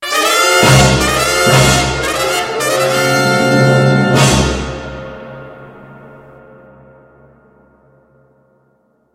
dramatic cue warning

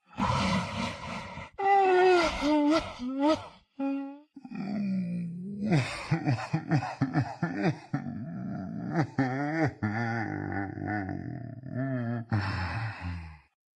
crying; the sickler

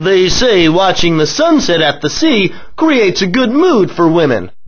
sunset romantic

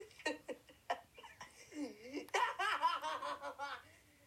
sped laugh

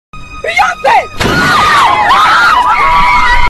boom scream

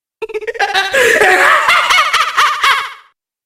laugh; devil jin

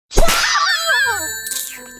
funny hitting sfx

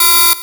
lazer; sonic

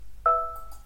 notification; mattermost

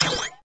hints button

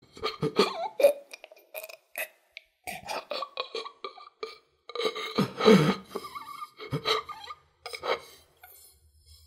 dying man

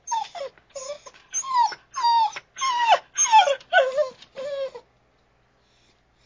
dog whimper

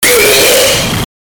gag

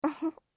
giggle

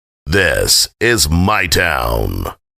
jingle

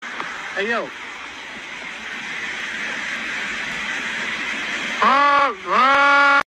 weird fish sound

